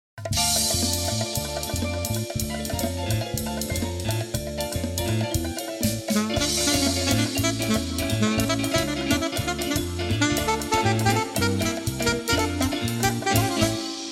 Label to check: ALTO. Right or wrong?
Right